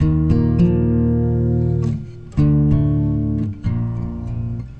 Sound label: Guitar Only; Sonic Foundry (Sony Creative Software); Friendly Fire